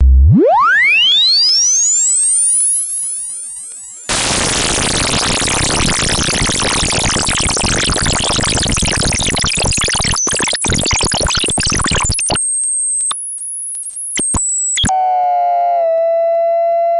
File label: diy synth